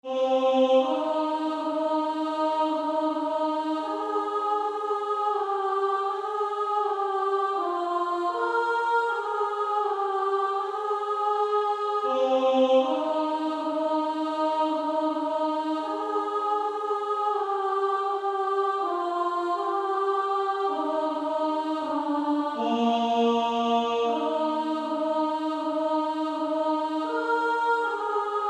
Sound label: Female Choir